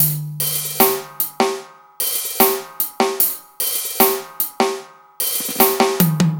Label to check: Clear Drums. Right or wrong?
Right